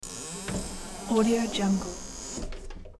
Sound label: door louvered bi fold open; hollywoodedge